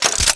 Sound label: reload, weapons; psp